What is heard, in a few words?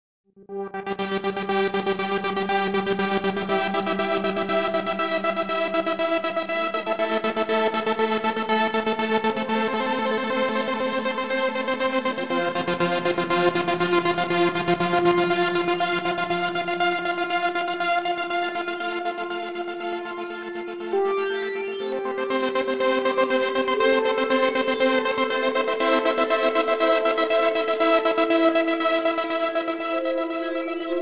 Ambient; Noisesurfer